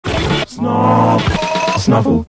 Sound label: ident snar snuffle; Chris Morris